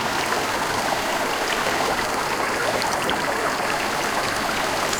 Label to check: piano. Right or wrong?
Wrong